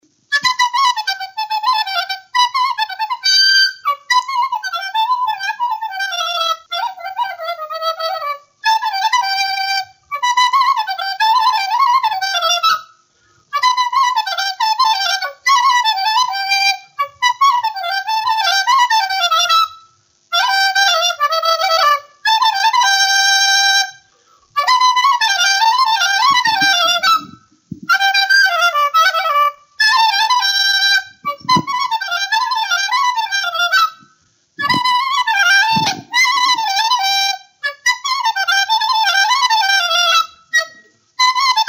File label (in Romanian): Hora fluier; Vaslui